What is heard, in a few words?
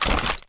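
inspect movement start, weapons; cstrike; valorant; reaver vandal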